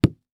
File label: Impact Blanket Hit By Metal Pole; Impacts